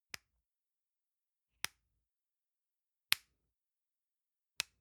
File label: lotion lid close soft; friseur; berufe